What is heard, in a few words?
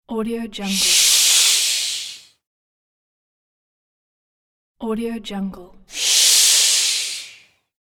audience says "shh"